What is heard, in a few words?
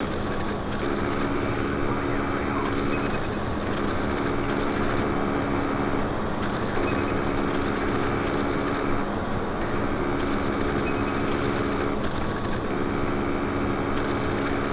ambience; cstrike; summit